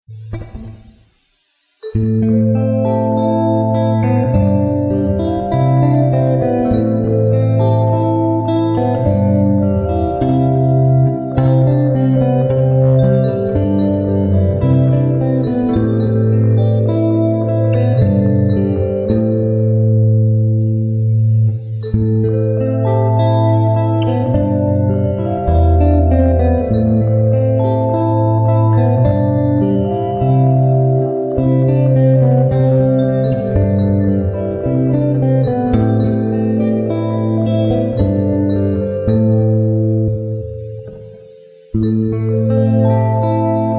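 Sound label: Vibrofon i gitara, vibraphone and guitar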